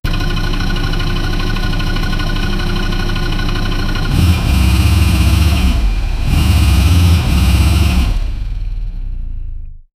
create an e cvt hybrid ca, engine

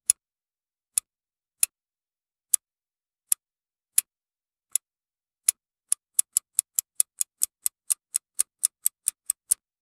bicycle click

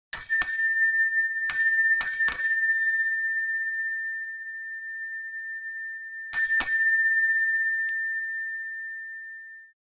professional bicycle bell

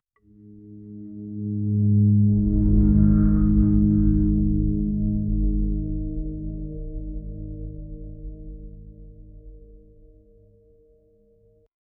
low flute or mournful tha

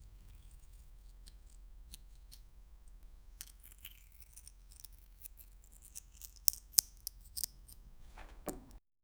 cutting a gem; gems

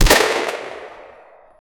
gunshot coming from a gun

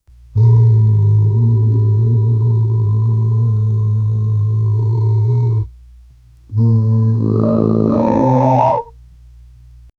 distant hadrosauridae her, dinosaurs

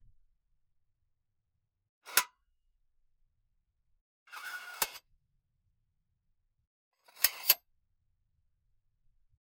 generate a bolt action ri, rifle

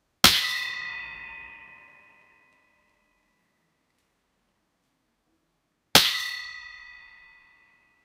metallic clang of a baske

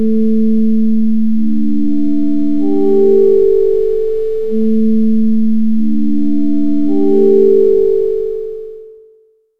a quiet calm sound barely

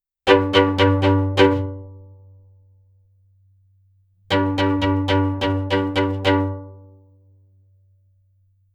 pluck the strings of the violin

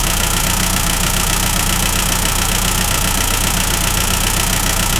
power yacht engine sound; watercraft

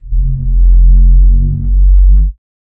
hyperpop bubble glass y2k

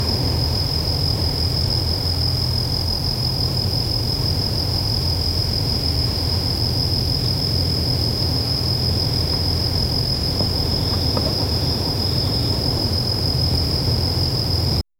campfire the night cricke

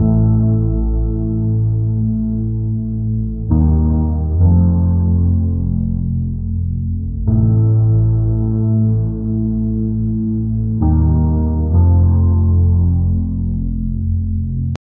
liquid bass ambient

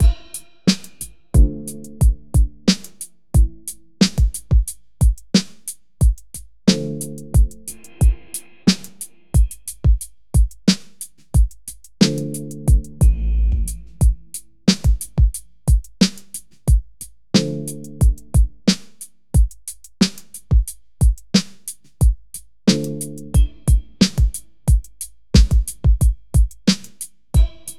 chillhop rhythm with mell

